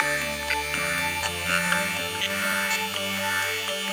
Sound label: SYNCHRO FUNK; Sony; ACID Pro 6.0